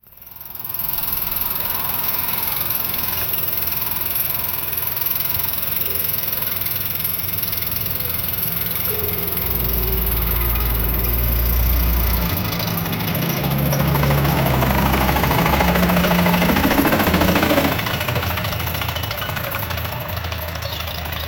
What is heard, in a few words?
the sound of a old, halloween